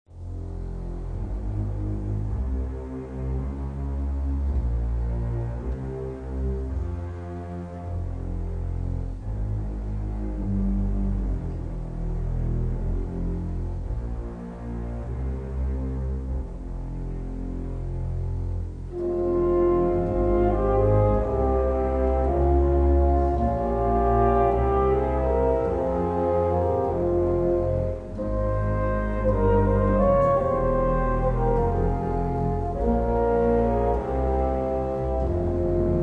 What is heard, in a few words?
Philharmonic Orchestra Choir, Once to Every Man and Nation